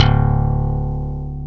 slap bass; FUNK

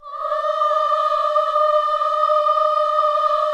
KIDS CHOIR, Gospel

